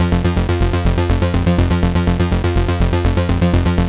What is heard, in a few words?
Arp Synth, Trip-Hop; Sonic Foundry (Sony Creative Software); Rondo Brothers Trip-Hop Loops & Samples